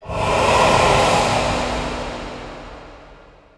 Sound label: shout; RYL 2